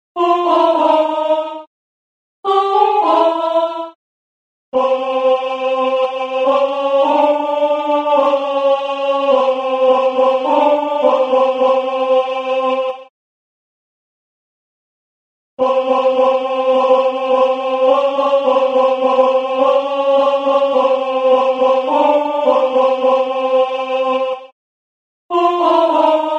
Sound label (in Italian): contralto